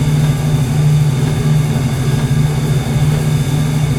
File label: random Wild Loop; fairyred